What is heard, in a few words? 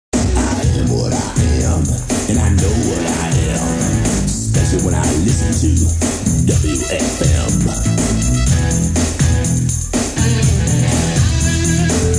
Jingle, radio